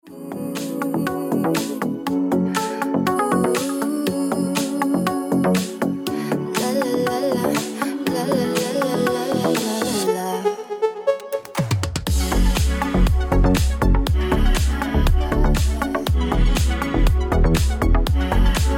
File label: Ringtone